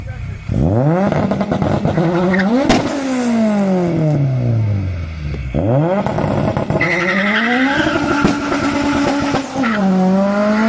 S2000 turbo

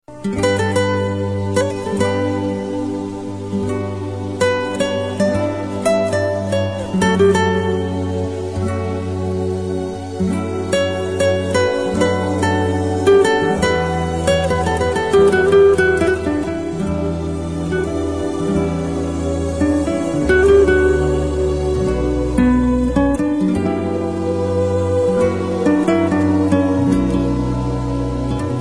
nice music tone; ringtones; latino

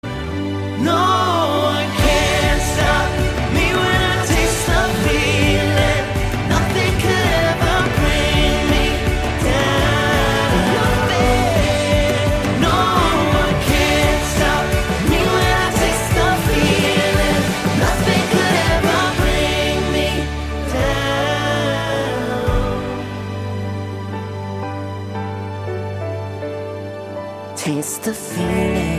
dark ton; iphone4s

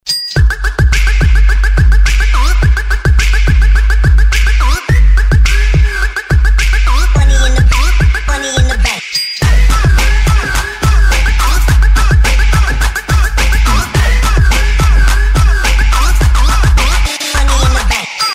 Bass Music

